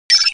computer beep

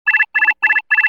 console, computer; DS9; Odo's Console

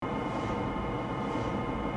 turbolift moving loop

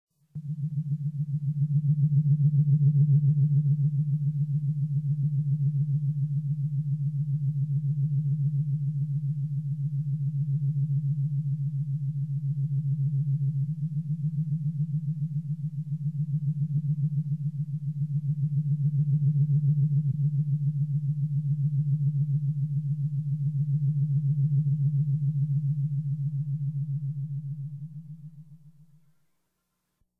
engineering, background; TOS

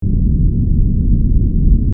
stationary ship

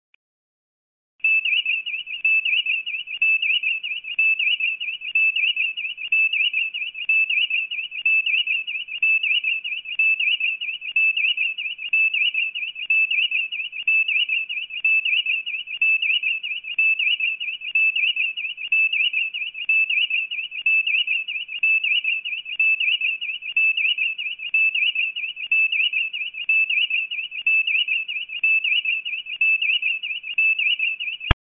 tos viewscreen fast

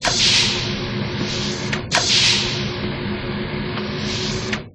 doors; TNG

